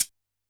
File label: Guiro Short, Standard Kit, Yamaha PSR-E323